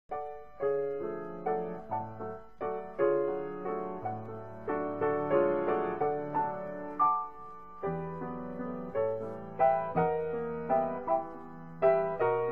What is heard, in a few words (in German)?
Rosenwalzer